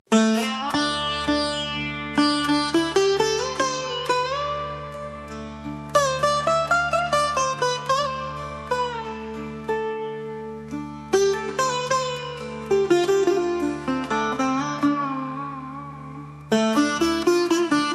Asian Rhythms

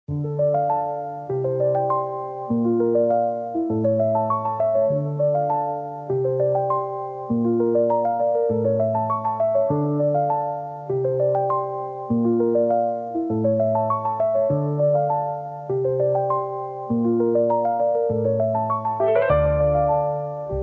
Asian Rhythms

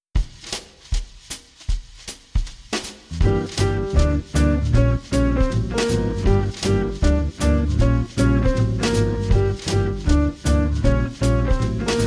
swing jazz 432 hz

